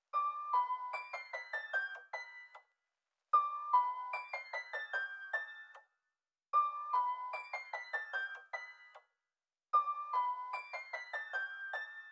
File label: Nostalgic Melody Loop - 150 BPM E Min Piano; Loop Stems; Cymatics; 2020 Melody Collection